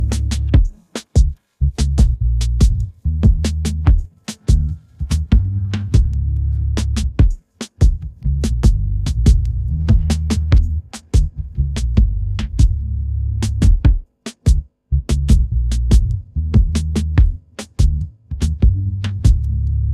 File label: ringtone rnb